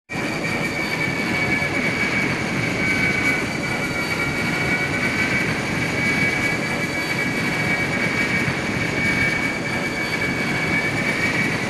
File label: evac hoverext; crow; Titanfall 2